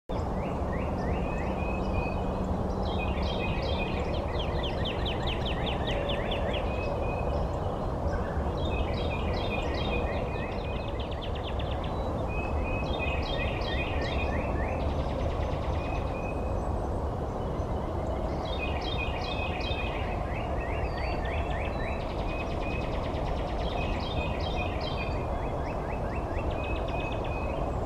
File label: female northern cardinal courtship display; ahmed suleman khatani